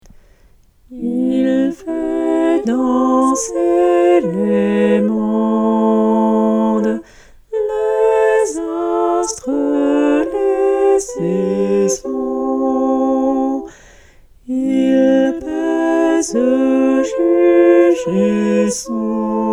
Il Fait Danser Les Mondes Basses

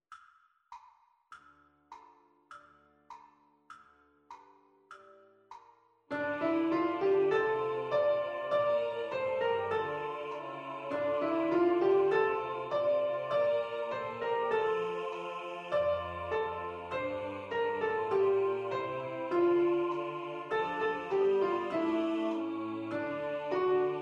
version 3 voix Soprano Piano; LE DOUX CHAGRIN

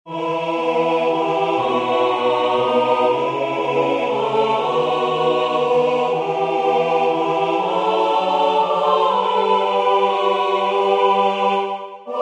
berceuse cosaque

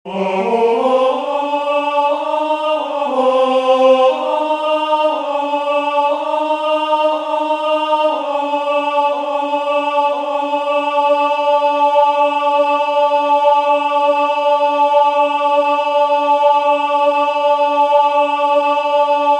soprane alto

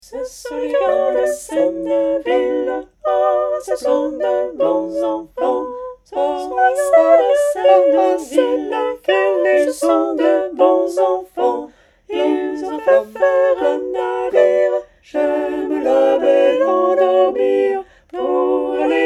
Les Gars De Senneville Tutti

